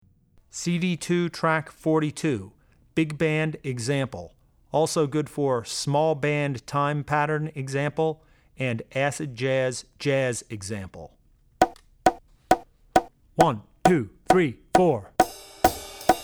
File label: Jazz - Big Band; Drummer's Bible Tracks